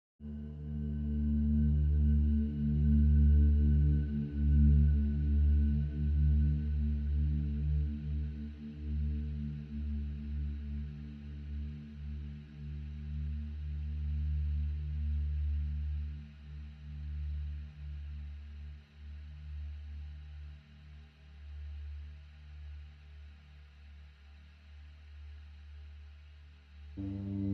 Pad Only

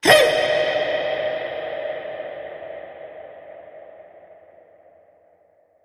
Vocals High Hoot

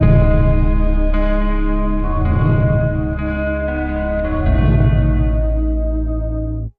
Link Sting

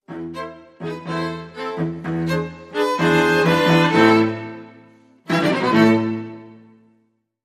Tango Sting

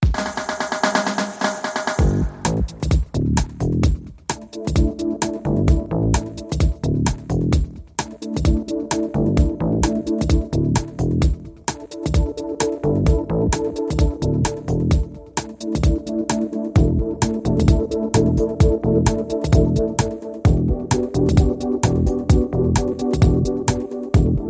Steady Pulsing

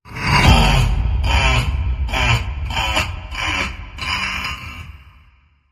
Dark Slow Laugh

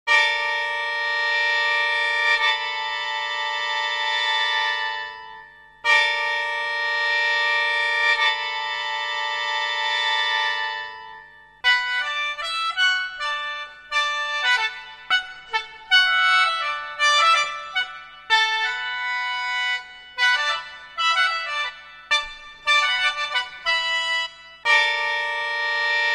Mouth Organ

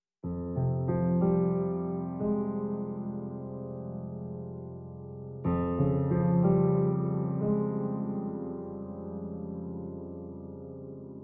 piano only

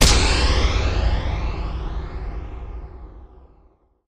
Big Impact Sweep

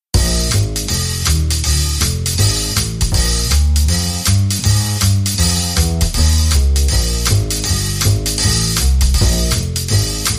Drum & Bass

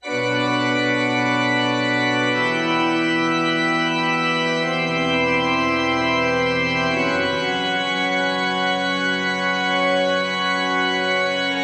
Cathedral Fugue

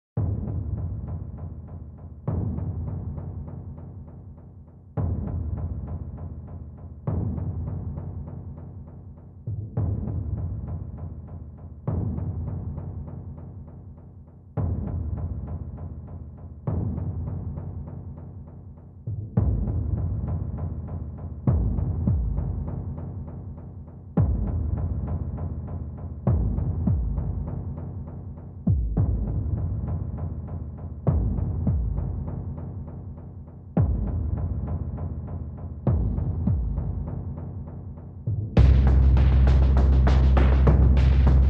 Drums and Bass

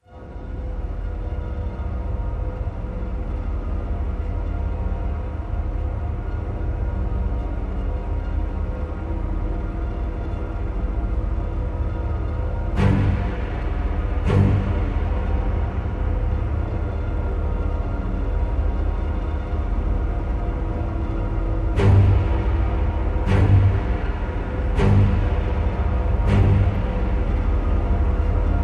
Orchestra Only

